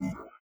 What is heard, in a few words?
click army menu; Stellaris